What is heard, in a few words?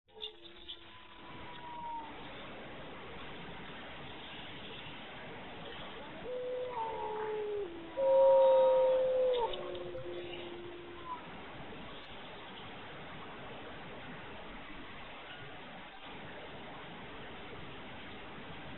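clean soundtrack from video with black wolves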